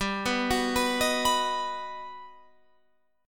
G Augmented Major 7th, Guitar, Open F; G+M7 arpeggiated; JGuitar

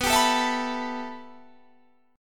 B 7th Suspended 4th Sharp 5th, Guitar, Standard; 19,22,19,21,22,19; B7sus4#5 strummed; capo=3

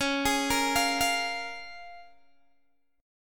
Db 6th, Guitar, Open G

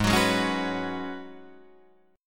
Ab Minor 11th, Ab, Guitar, DADGAD

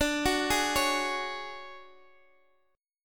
D Major 7th Flat 5th D down Guitar Open D

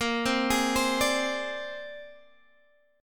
C 7th Suspended 2nd Sharp 5th A sharp

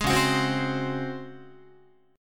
Db Major 7th Suspended 4th, Guitar; strummed

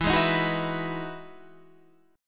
E Major 9th E down Guitar Drop D

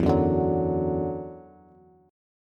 E 7th Suspended 2nd, down, Bass; E7sus2; strummed

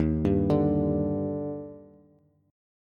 Eb Major 7th, Bass; EbM7; arpeggiated; JGuitar

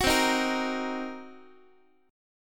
C Minor 7th Flat 5th Guitar, chord; Cm7b5 strummed; JGuitar

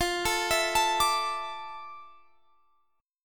F Major 7th Add 6th, guitar chord

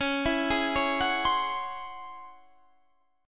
Db Minor Major 11th Db Guitar Standard; DbmM11 arpeggiated; JGuitar

